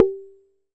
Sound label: Jomox MBrane, drum machines; Jomox MBrane 11